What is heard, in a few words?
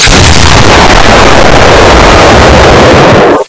shotgun lloud